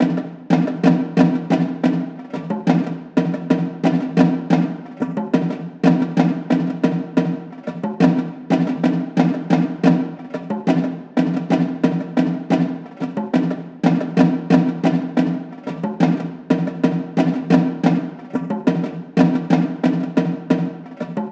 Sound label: Tablas, 90BPM, Percussion; Percussion Kits; STEMS; Ghosthack; SkyRealm; Kit 14 - Wrecking Force